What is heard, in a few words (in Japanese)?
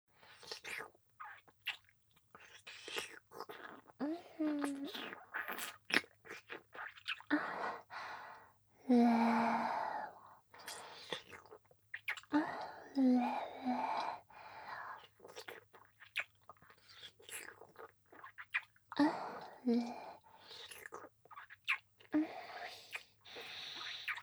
舐め フェラ（ゆっくり）